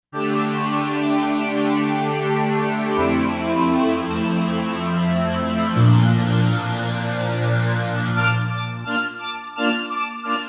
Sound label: Phase Strings; Roland SC-880; Factory Patches